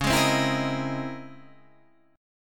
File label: C sharp Minor Major 13th, guitar chord